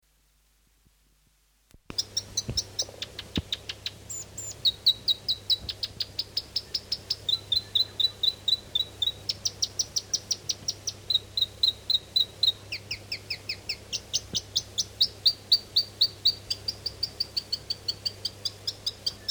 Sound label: south island robin song; conservation, native animals, birds, bird song